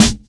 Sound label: good urban steel snare drum sound a sharp key